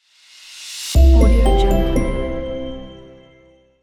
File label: the logo